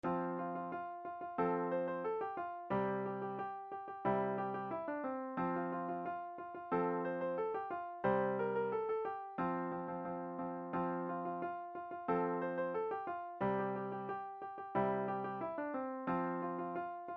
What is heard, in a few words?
sixteenth notes, piano; Ten little Indians; beginner piano music